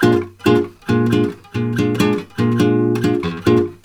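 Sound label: A# 7 guitar, Brazil Bossa; USB Soundscan; AKAI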